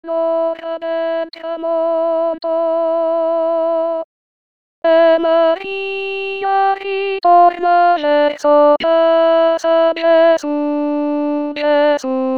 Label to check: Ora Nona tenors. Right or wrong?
Right